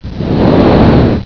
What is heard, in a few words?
damage